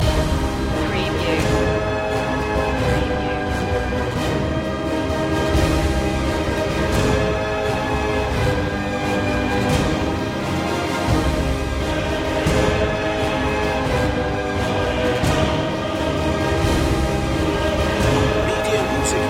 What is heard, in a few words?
dark destiny march